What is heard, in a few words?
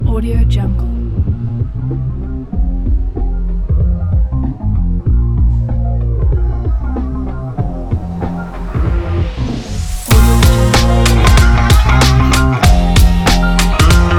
hip hop streets